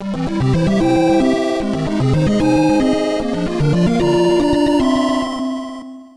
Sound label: Level Clear Jingle; mario tennis open; Special Game - Super Mario Tennis